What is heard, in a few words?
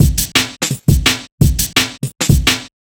Funky Beat, Drum N Bass; Sonic Foundry (Sony Creative Software); Knutrix Drum & Bass